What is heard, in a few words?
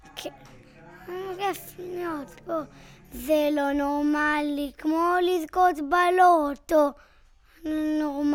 VOC